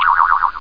can sound; How Many Bugs in a Box

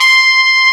trumpet C5; Star Trek JR Audio

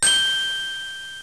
Crystal; Treasure Galaxy (1994); Learning Company

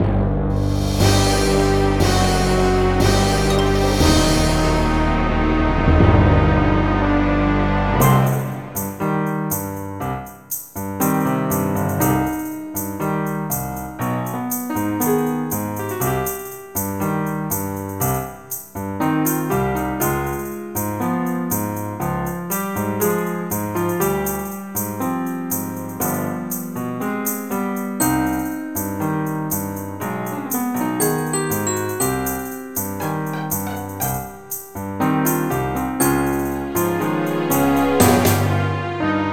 Tango

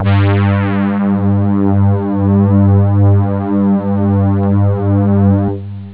hi synth

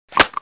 gore; earshot

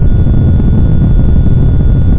boost in

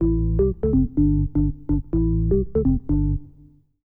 organ house 125 A; eJay; Keys

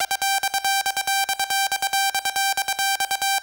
Techno, Melody, H4; Techno Music Maker

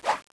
slash; duke nukem total meltdown

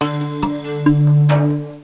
Rain Forest

